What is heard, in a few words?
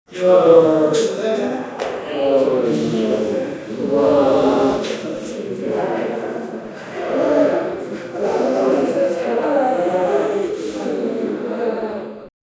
audience noise mumbling